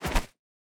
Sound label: punch miss; gmod; arccw uc commons attachments